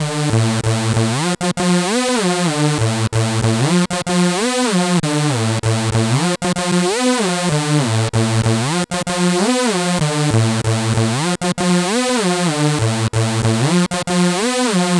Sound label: Buzz Synth; Sonic Foundry (Sony Creative Software); Club Joints and Street Anthems; Toxic Rain